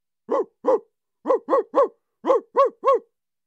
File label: Barking cartoon dog; پارس سگ کارتونی